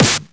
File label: Snares; Vengeance Samples